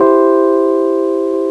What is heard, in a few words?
piano keys